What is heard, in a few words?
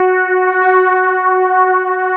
electric pianos; Wizoo Electric Pianos